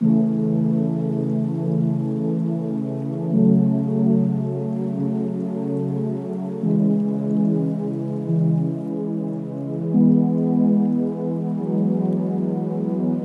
Lofi Tape Piano Chords 145bpm C Min

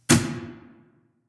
Thud Echoed

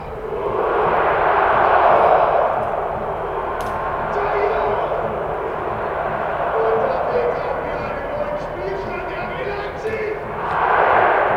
Soccer Fans Vocals Field Recording; Zoom H2n

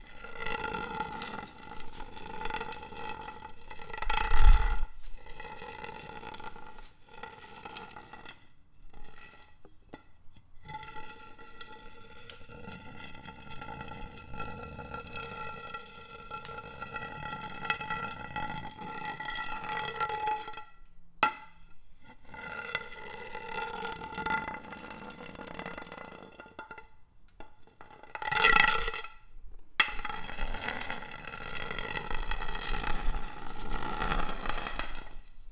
Stick Dragged

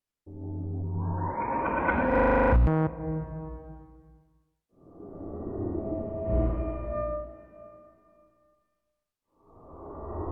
Alien Sounds

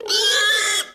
Pig Squealing

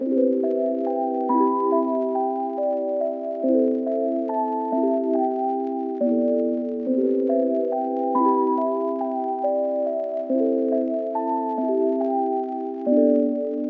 Lofi Bells 140bpm Amin

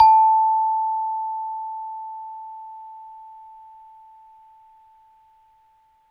Chimebar A5

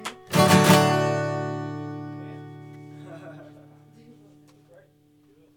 Ending Chord D Major Guitar

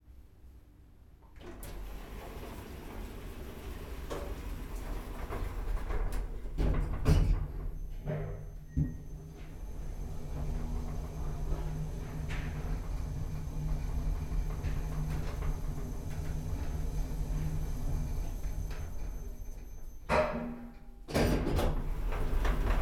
Elevator Up

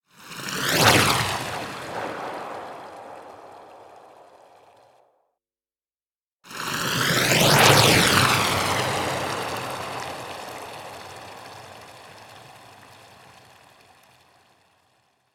Water Elemental Whoosh

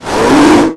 Dragon Pain

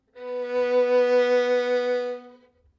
Violin B 4 Tenuto Vibrato

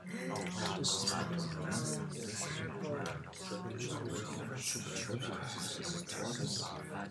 Crowd Worried

